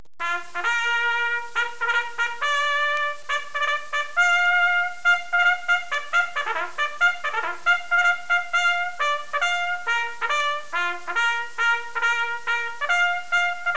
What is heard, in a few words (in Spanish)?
musical